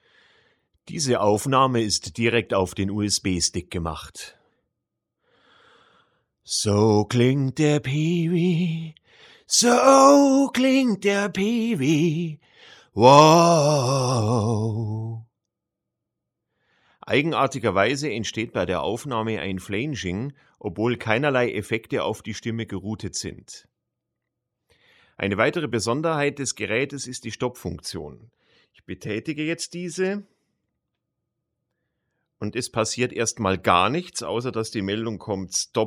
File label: Flanging